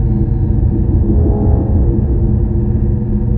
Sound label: generator hum loop